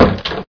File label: door; life; gaccha